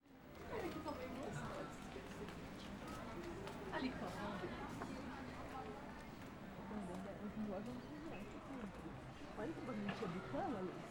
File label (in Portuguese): Feira Torre de Tv musica vozerio mulheres vassoura risadas; canais stereo